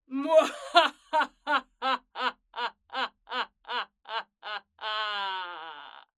Laugh, Female, Late Twenties, Mwa ha ha, Evil, Strong, Forced; Human